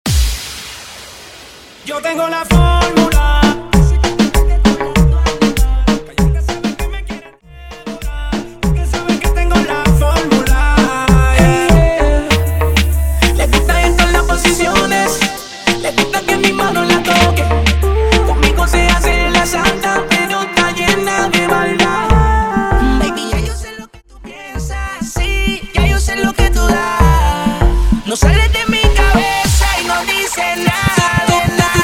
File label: Version 98 BPM